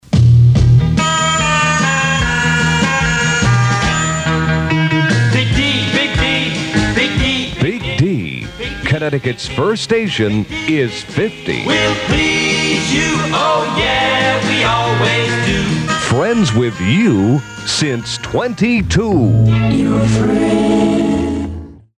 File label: jingle